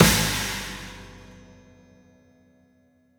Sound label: Snare, Crash, Kick; Essential Percussion